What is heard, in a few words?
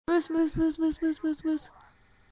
moose call